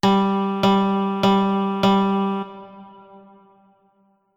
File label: Rhythm Notation Guide Quarter Notes